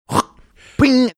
making a spitting sound and spit hitting a spittoon